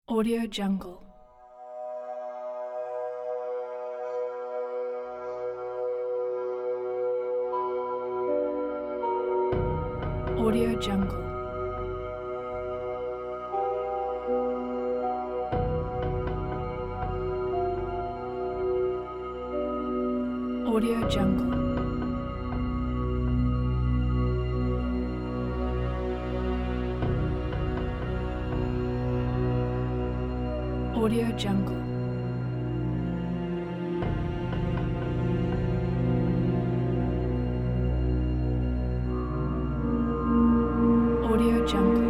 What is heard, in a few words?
cinematic tension